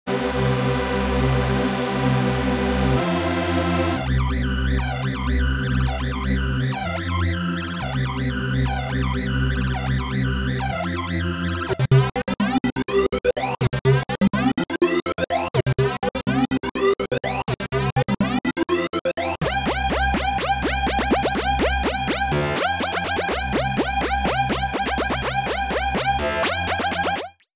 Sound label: Lush Pad